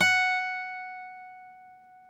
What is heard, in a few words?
F#, guitars; Spectrasonics; Hans Zimmer Guitars VOL-2; FNGSTYLE PFM